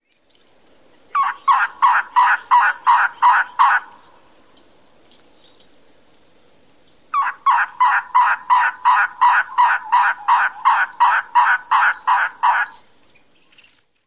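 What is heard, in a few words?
call, Elegant Trogon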